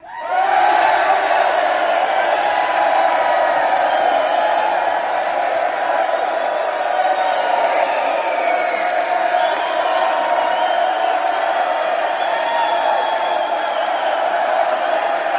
Crowd Cheers Excited, big arena

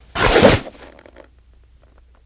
Paper Cutter Slicin; paper mvmt

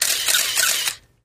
35mm Camera Auto Win

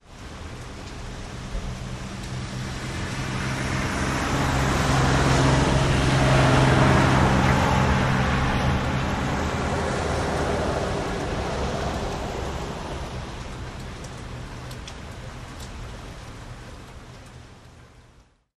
driveby rain, wet traffic

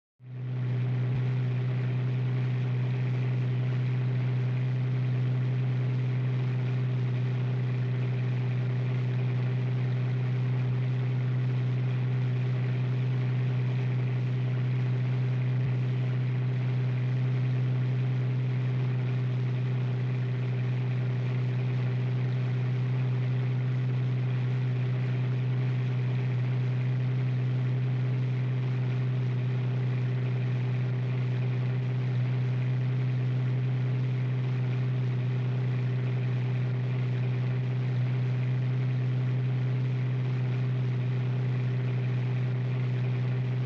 Truck Interior Stead

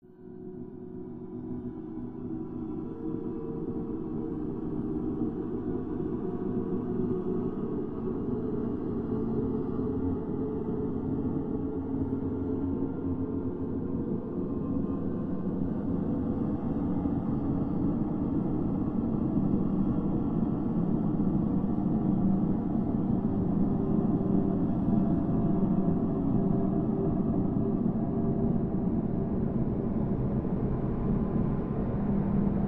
Slow Rising Airy Space

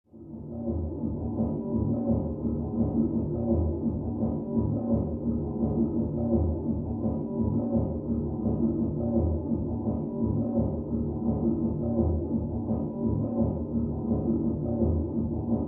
Mx Electronic Dance

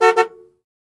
Jeep Cherokee Horn Honks, 2 Short

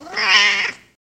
Cat Growl Angry

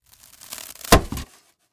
Styrofoam Sheet Crac, plastic

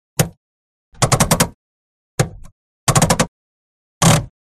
Automobile; Hand Brake; Austin Ruby Hand Brake.

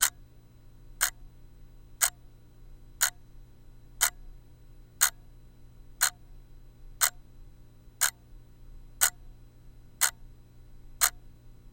Clock Mini Alarm Tic

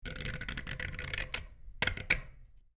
Metal Dial Rusty Metal, switch clicks

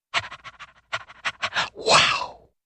Dog Pants Excitedly

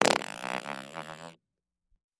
Close Up Fart

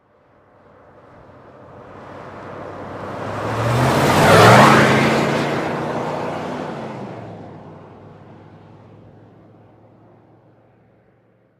Expedition Slow By Ac, ford expedition

